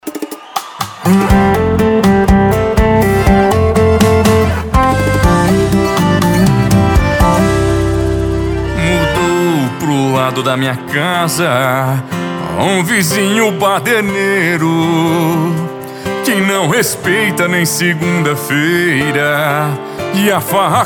sertanejo

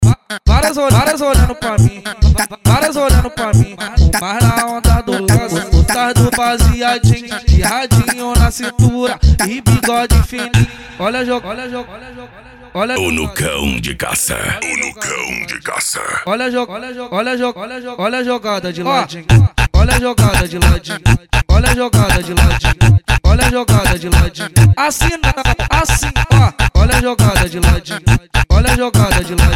funk ritmado

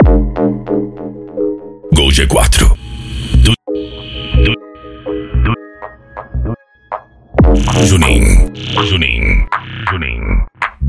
eletro funk

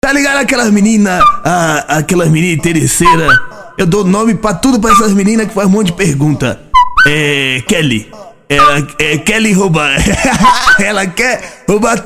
especial funk e eletrofunk

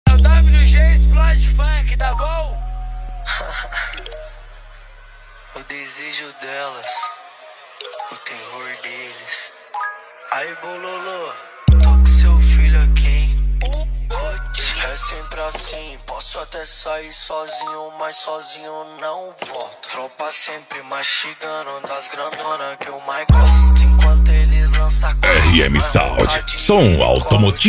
som automotivo

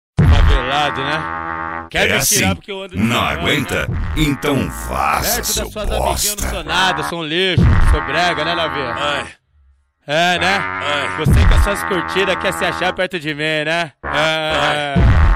mega funk funk

